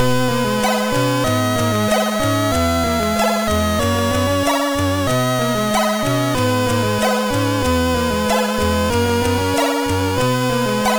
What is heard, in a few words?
Boing, MOS6581R3; hvsc